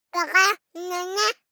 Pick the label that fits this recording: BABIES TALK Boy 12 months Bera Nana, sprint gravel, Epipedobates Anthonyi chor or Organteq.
BABIES TALK Boy 12 months Bera Nana